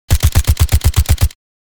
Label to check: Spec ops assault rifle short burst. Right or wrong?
Right